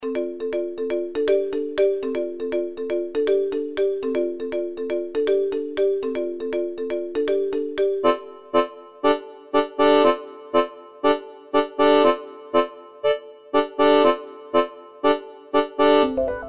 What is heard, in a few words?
hyper arps